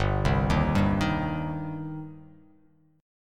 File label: G Suspended 4th Sharp 5th, Gsus4#5 chord; arpeggiated; JGuitar